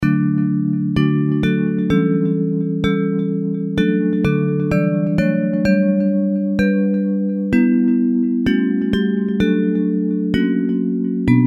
O Perfect Love Bells; Hymns; Praise

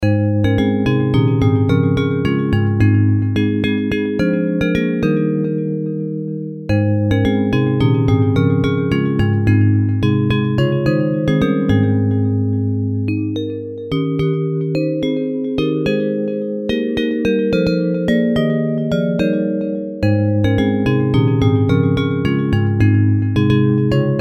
Softly and Tenderly Jesus Is Calling Bells; Hymns; Praise

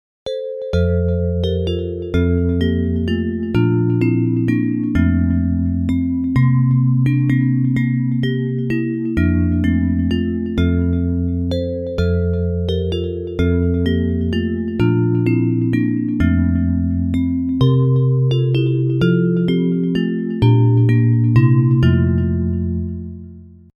Away in a Manger Bells; Hymns; Praise

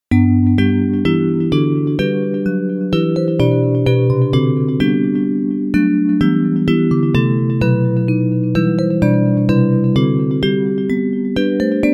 We Utter Our Cry Bells; Hymns; Praise

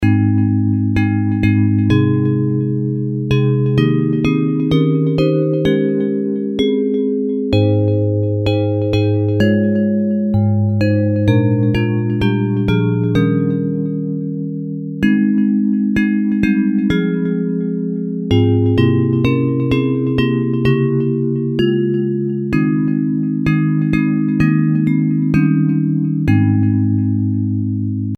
I Sought the Lord Bells; Hymns; Praise

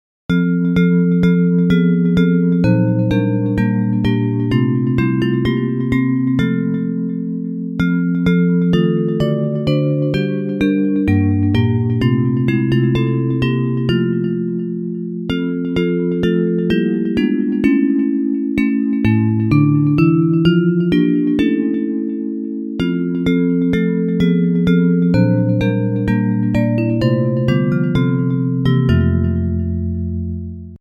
O Little Town of Bethlehem Bells; Hymns; Praise

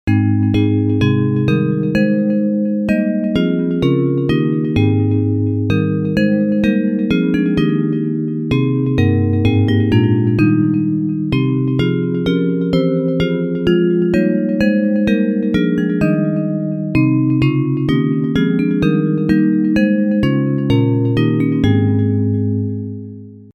Ye Servants of God Bells; Hymns; Praise

